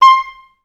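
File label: oboe staccato C5; OBS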